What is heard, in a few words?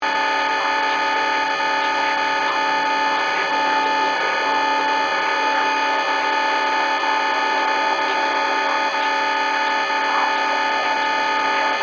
Communication, Scifi, Communication, Malfunction, Alarms, Science, Fiction; BlastWave